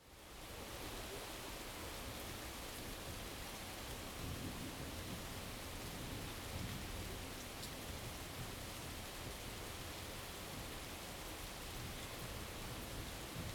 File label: Rain & thunders in the city